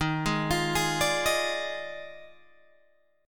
Eb Major 7th Suspended 2nd Suspended 4th Eb Guitar Open G